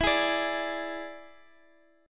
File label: E 7th E down Guitar Open F; strummed